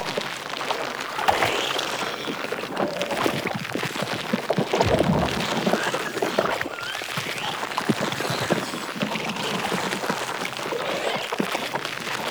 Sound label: Infested Survival Purification Machine Buried Loop; Warframe; Nineteeen Ninety Nine